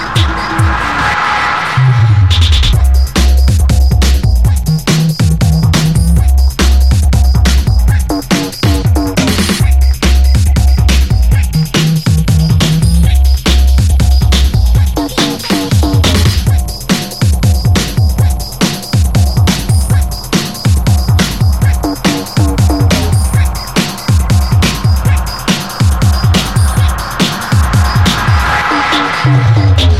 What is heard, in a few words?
rap rock